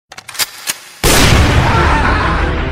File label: sniper shot